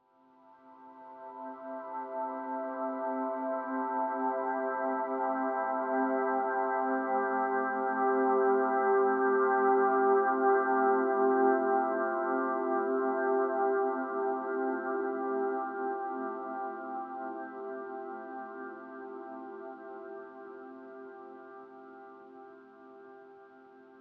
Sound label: Chord Blur; inside